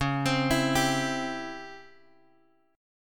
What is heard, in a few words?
C sharp Minor Major 7th Flat 5th, guitar chord; C#mM7b5 Chord {X 4 X 5 5 3} arpeggiated